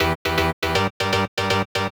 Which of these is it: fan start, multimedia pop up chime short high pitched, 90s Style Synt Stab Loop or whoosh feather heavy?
90s Style Synt Stab Loop